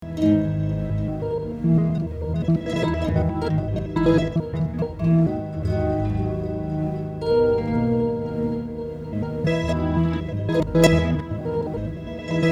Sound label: Dragging The Tempo Around